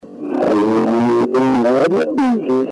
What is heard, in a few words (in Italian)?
arrivano in tromba